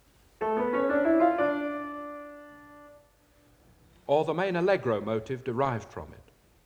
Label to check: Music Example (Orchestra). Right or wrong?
Right